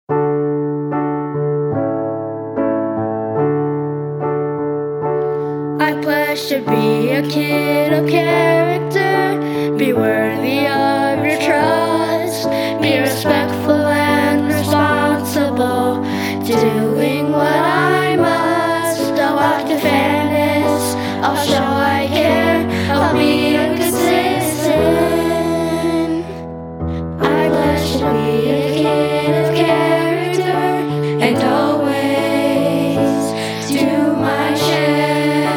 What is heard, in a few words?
Choir; CC Anthem